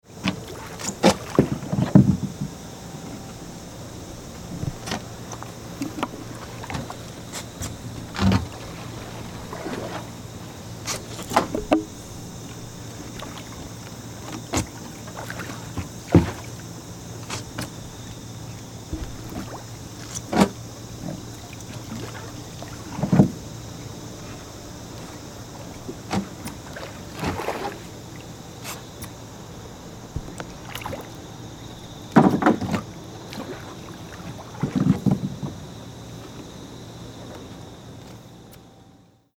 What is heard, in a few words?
boat river